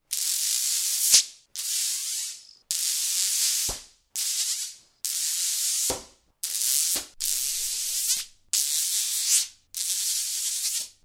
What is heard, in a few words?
Vibrating Magnets, Two magnets vibrating against each other; Wobbles; Tom Hutchings